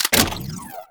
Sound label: Shotgun Reload, Weapons; Warframe; Corpus